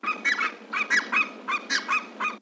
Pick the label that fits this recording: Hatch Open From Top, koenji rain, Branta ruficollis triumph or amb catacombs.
Branta ruficollis triumph